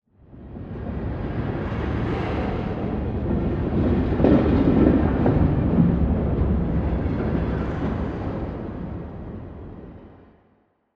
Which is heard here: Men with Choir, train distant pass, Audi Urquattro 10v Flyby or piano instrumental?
train distant pass